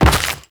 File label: Step Dirt, Footsteps